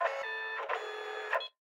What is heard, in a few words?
Gun Scan; Lethal Company